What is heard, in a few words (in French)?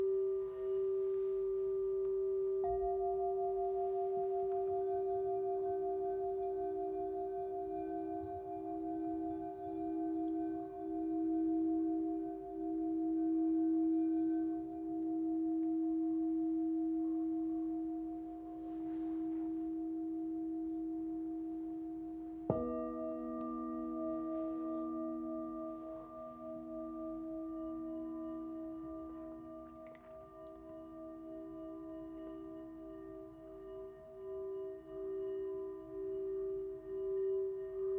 Bain sonore Bols cristal